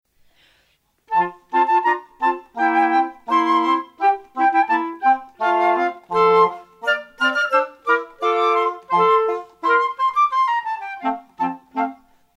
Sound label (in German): Kreuzpolka